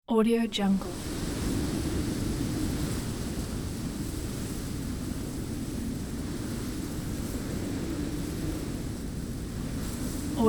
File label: strong wind in grass